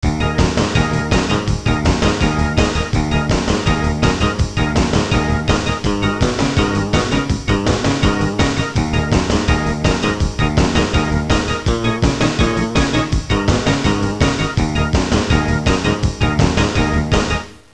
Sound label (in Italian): suonerie musica rock